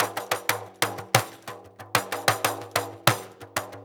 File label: 6-8 Pandeiro, Perc Loops